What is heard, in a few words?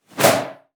BOW PERC, BASS FINGER; Xsample VOL-5